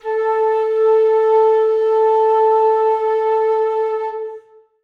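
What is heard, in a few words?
flutes sustain a3; Sonatina Symphonic Orchestra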